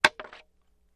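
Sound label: Staff Hits Light Scrape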